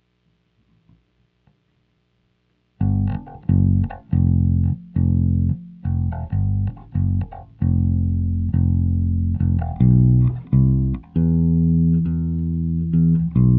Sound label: Bass